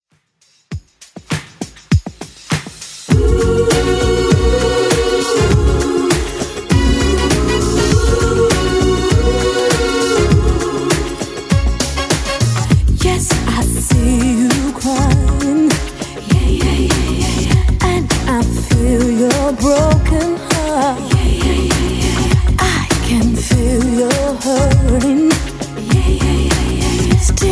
90s Redrum 100 bpm CLEAN

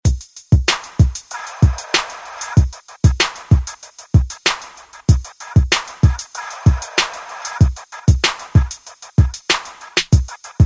ReDrum 95 Bpm; DJDOLLS

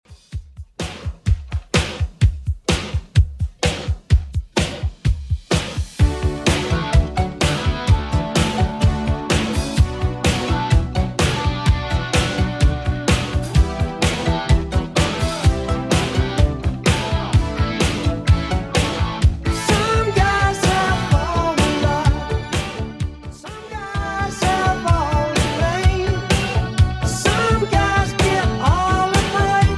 80s Redrum 127bpm

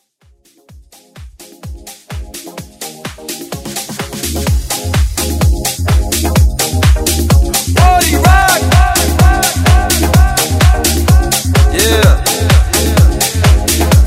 House

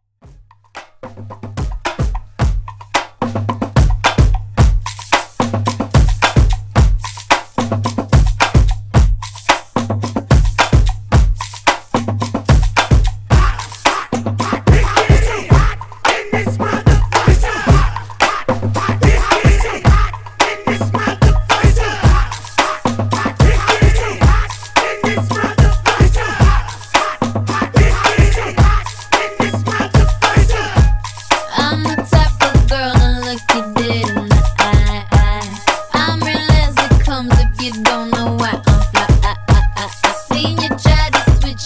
Hype Re Drum 110Bpm

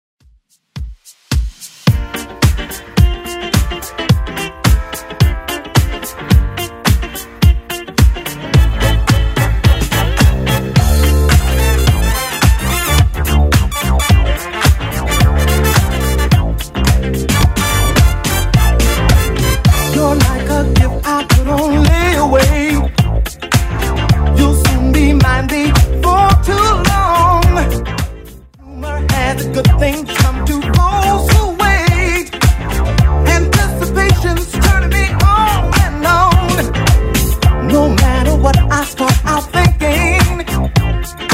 80s Redrum 108bpm

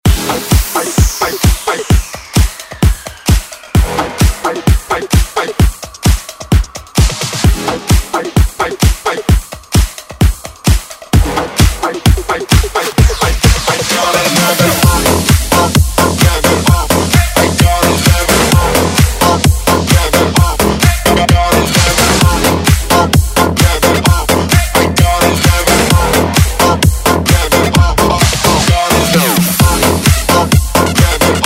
bounce

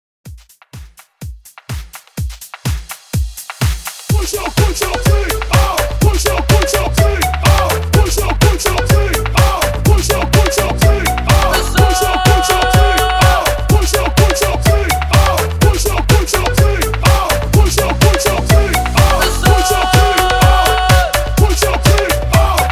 125 BPM

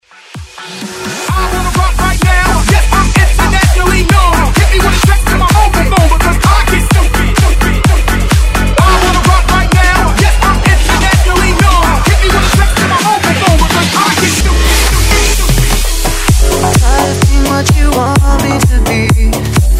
Hybrid Bounce Bootleg 128

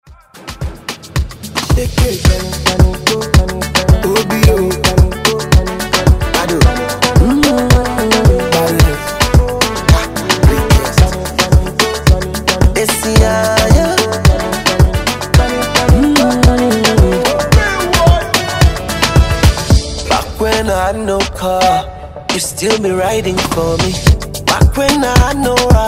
Moombah Afro Redrum

